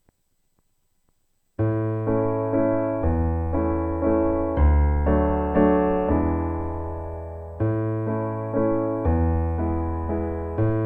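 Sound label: acc, Practice Tracks; berwick-choir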